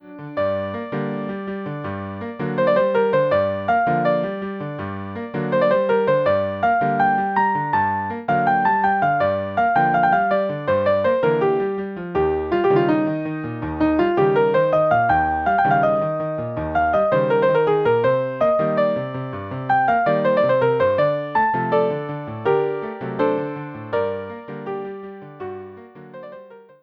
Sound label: Piano Solo